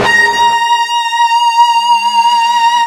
FUZZ A#4, ULTRA FUZZ, Guitar; East West; Steve Stevens Guitar Samples Collection

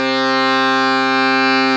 Accordians, Euro. Accord; Club-50 - Foundations Roland

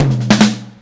Beat Intro, Indie Rock; Silos; Sonic Foundry (Sony Creative Software); Indie Rock Anthems; Session 11 - Silos

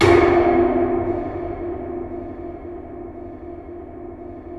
space bass H; InVision Interactive; Lightware VOL-1; Instruments & Percussions; DEMBY

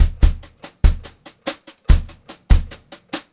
144 vari, Brushed Drum Loops; USB Soundscan vol.39; AKAI

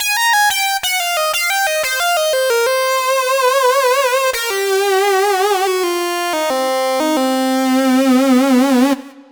Lead Synth Lick; Dr. Fink's Funk Factory

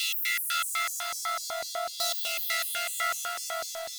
Synth loop (120BPM); cinematic drama; Loops; musicradar

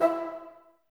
Bassoons E 4; Miroslav Vitous - Woodwind Brass Ensemble

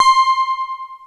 PPG, MICROWAVE; East West - Global Sounds

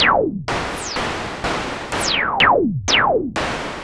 SH101 Clocked LFO Rhythm (125BPM)

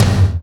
TOM D, Drum Dry; East Collexion; Drum 1

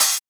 Hat Open

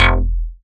bass F1, PPG Wave 2.2

